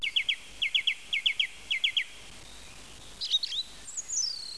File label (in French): grive musicienne